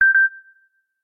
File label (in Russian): originalnye ringtony i uvedomleniia samsung galaxy s22 s22+